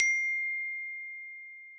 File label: iphone message tone ringtone